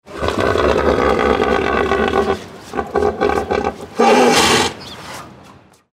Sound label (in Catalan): hipopotam